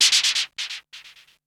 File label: Gated Cymbal; musicradar